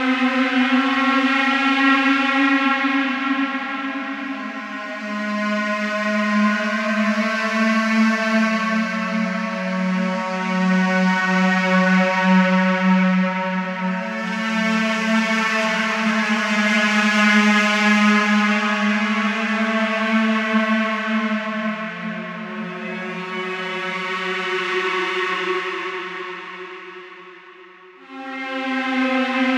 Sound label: E-bow, Guitartronics; Mountains Of Io; Sonic Foundry (Sony Creative Software); Ma Ja Le Ethereal Textures